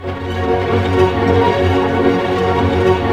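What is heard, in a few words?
strings arpeggio major, symphony orchestra; Roland LCDP08 Symphony Orchestra; STR Arpeggios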